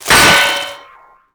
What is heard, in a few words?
mohole pin snap